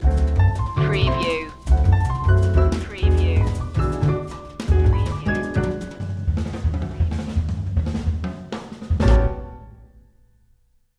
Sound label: jazz up stinger